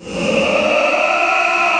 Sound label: attack; svencoop; morass beta; de man